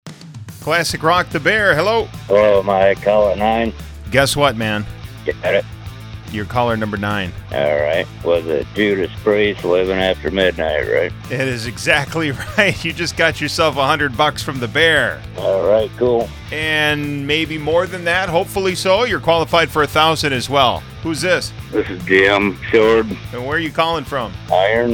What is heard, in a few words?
riff